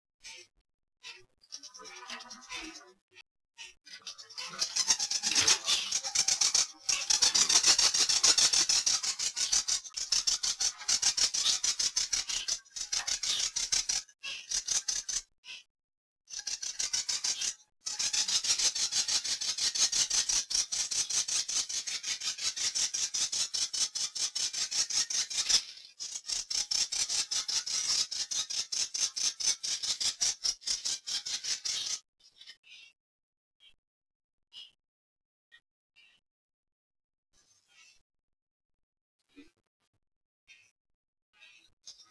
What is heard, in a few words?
Chitter female high intensity, loris